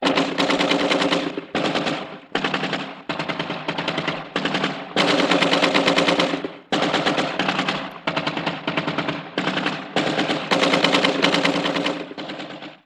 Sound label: machine gun; Crash Bang Boom